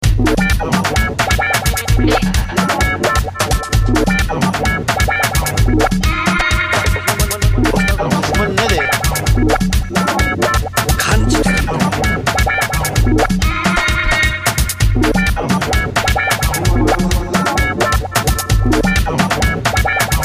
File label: Twang Spang